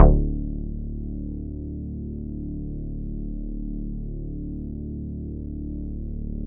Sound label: bass, synths